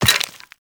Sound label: walk bone, footsteps; Warframe; Operator